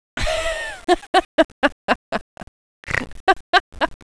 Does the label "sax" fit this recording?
No